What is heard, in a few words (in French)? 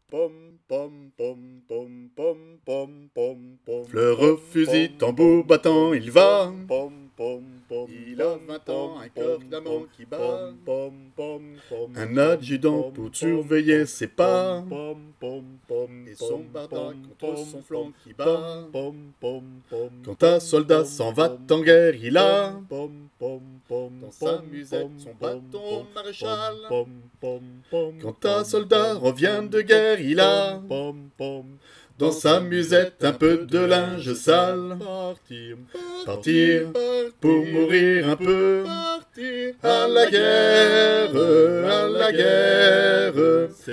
Altos